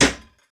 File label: metal hit